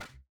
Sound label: Attack; Attacks